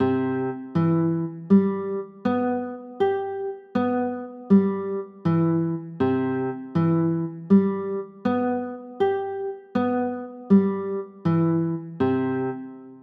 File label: c maj7